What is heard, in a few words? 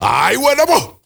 MALE RAV, MALE NOISE, Heart of Africa